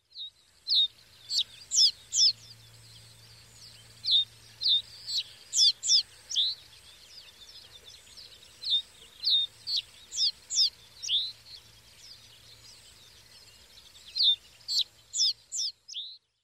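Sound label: Common Reed Bunting; doto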